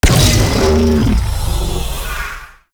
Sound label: Sentient Condrix Damage; Squad Link